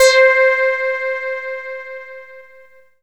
METLB, synthesizer bass; Synthesizers; Soli-Music; World of Synthesizers VOL-1

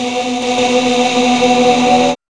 CHOIR; X-Static Goldmine 1

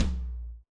RX8 Tom, Yamaha RX-8

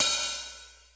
open hh, hihats

